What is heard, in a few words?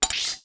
Mini Map On